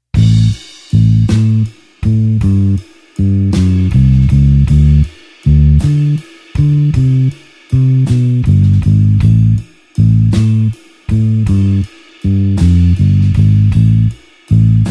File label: blues bassline slow A